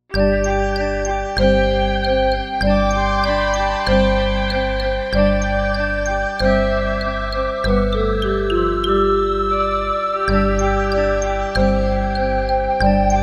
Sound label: playful turns, ambient